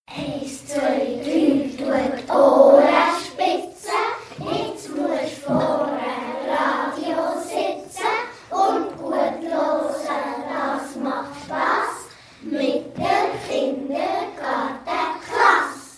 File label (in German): Lied und Geraeuscheraetsel; Herrenschwanden